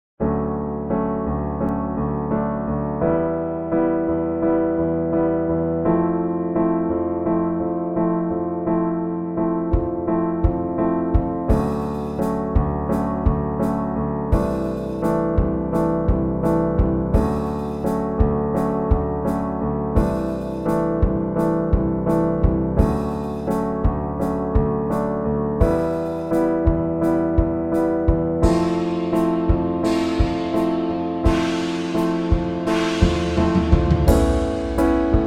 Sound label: piano thing rough drums